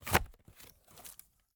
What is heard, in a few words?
opening box wrapping paper